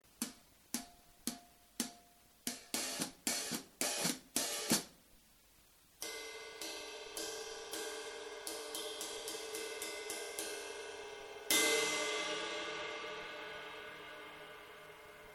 cym, brushes

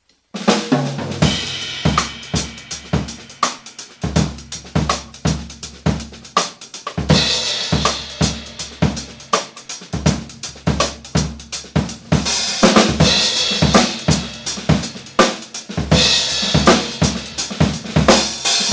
drumline; craviotto; 14X6.5 + TAMA BRASS HOOP 1

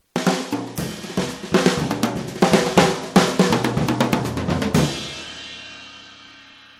hot, brushes

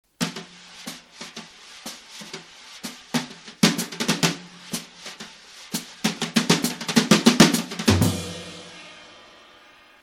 rock, brushes

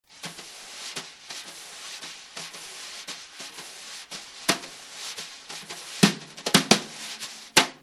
sweep, brushes

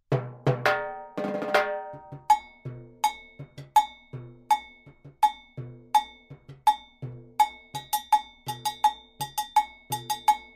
LP cowbell

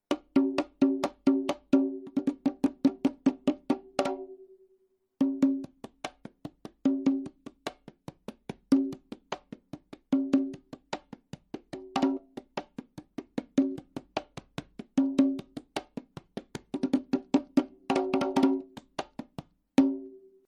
conga

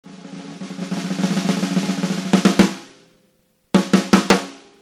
snappy; PEARL; SN-1442I

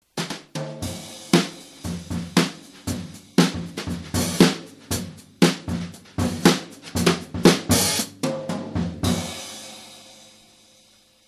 brushes set

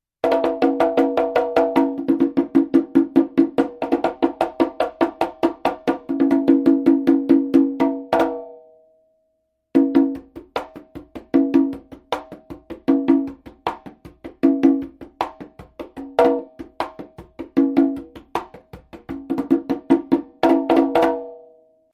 FIBERSKYN CONGA

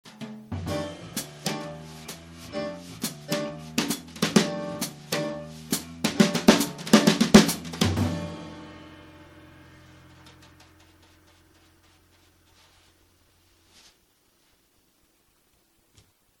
classic, brushes

